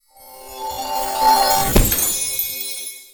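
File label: World Map Unlock; Metagoal Normal; rakoo friends wii u 2015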